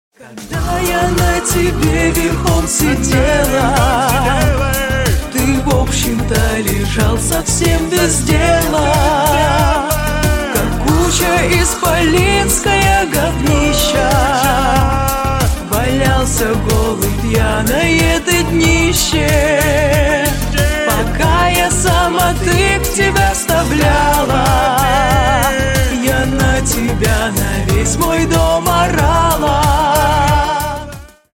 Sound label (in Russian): zhenskij golos